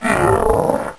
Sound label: Big Roar; Sett; Playable Tutorial Level